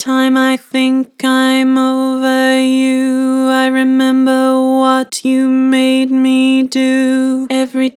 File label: Vibrato, Vocals; Every time I think; Harmor Vocals